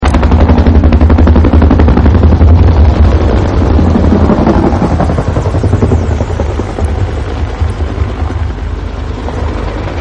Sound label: huey fly by